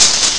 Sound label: hihat; Amiga Soundtracker Sample Packs